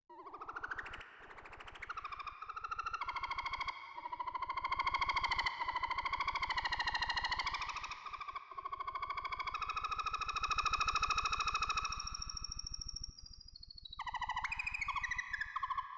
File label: Ambiance